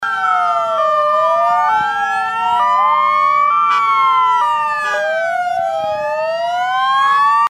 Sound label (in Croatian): sirene